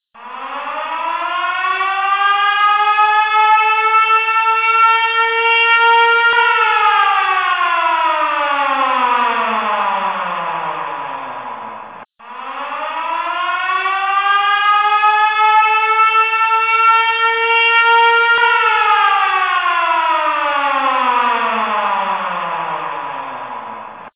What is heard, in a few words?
jail mega alarm; cstrike